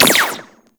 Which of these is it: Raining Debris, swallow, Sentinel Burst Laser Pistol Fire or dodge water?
Sentinel Burst Laser Pistol Fire